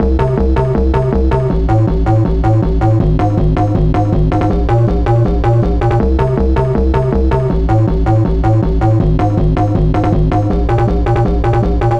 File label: gro 160, Hardcore Loops, Bass Loops; Dance Synths